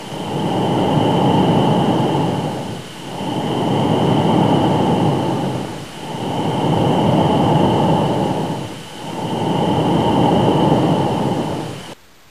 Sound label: Plasmaspheric Hiss